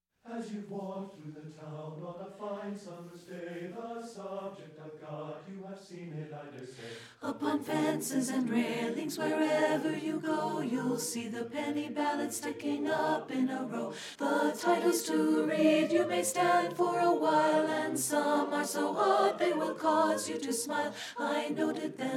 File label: ALTO; satb